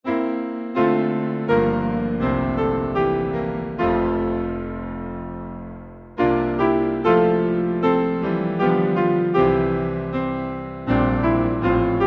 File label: Hymn piano slower; Like the murmer of the Doves song; Bridegroom